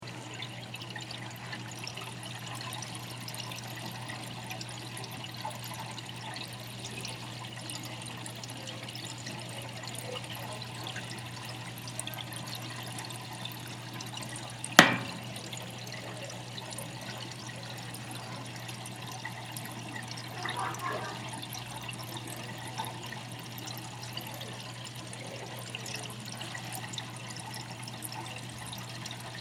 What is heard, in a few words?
Drama 20, water